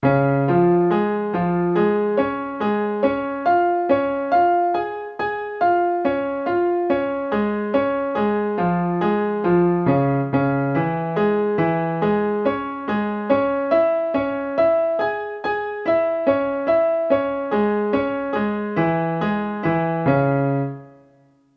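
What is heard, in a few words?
triad and inversion broken chord triplet in D flat and C sharp minor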